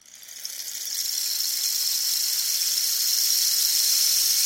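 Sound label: coffee bean sounds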